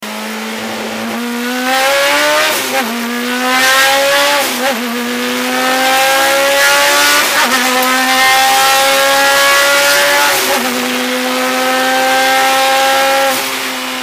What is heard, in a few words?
FORD CAPRI ZAKSPEED